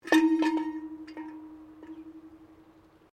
Bell Cowbell European Large, intrumental cowbell

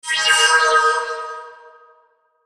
Sweeper Swirl Flange Ring; Production Element Imaging Element Accent Transition; Sweeper Sound Effects

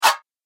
Whoosh Strike Match; Whoosh Sound Effects; sound design

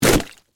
Splash Wet Heavy, Mud; Texture; Splashes (Sound Effects:Objects); BlastWave

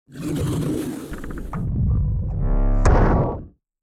Distortion Left To Right; Production Element Imaging Element Accent Transition

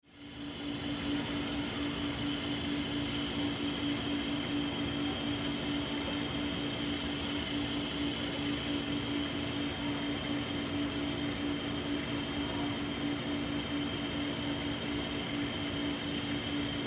Pet Shop Near Aquariums Ambience; Stores and Malls; Animal Pet Shop Near Aquariums Ambience; Blastwave

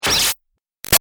Shot Laser Big Solid; Laser Sound Effects

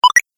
Collect Chiptune, video game

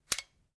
RIFLE CZ 452 VARMINT 22 CALIBRE MAGAZINE RELEASE; A selection of domesttic firearms; Rifles

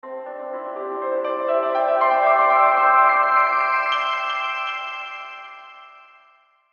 Logo Piano Strings Ascend Delay; Sonic Logo; Blastwave

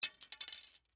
Warfare, Arrow, Drop; Bow And Arrow Sound Effects; Combat; Bows and Arrows

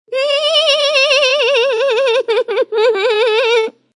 Annoying Kid Laugh, Totally annoying laugh.; Funny Sounds; Comedy

